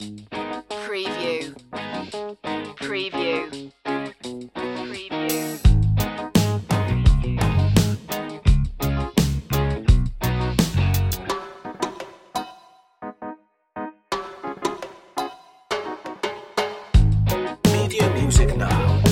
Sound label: reggae fuzz